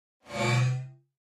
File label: Mouse Over Elect Wash